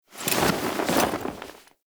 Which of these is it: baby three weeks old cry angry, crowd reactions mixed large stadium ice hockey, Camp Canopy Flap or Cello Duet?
Camp Canopy Flap